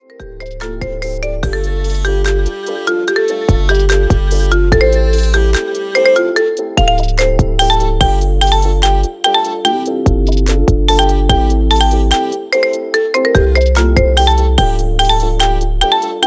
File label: Marimba Remix